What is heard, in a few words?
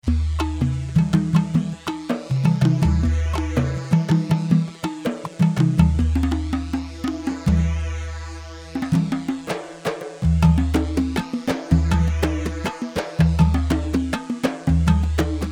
Bandari Nashef 4/4 162, يمني; ايقاعات; شرح يمني